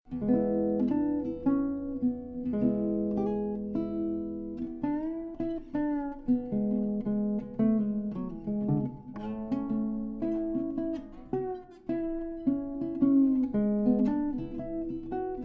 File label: parlor guitar